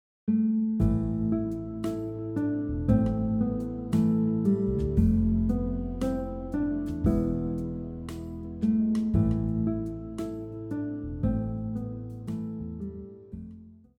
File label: guitar; God Rest Ye Merry Gentlemen; christmas songs